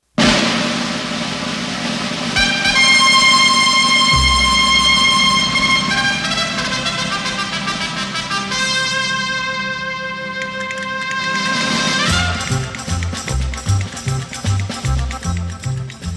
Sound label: Announcement trumpets long